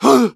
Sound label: pain sharp; Medic